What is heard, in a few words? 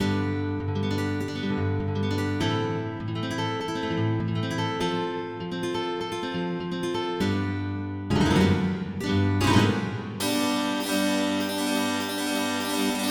Chord effects; MIDI